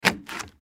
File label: Three Hole Punch Paper Small Plastic; Hole Punchers; Blastwave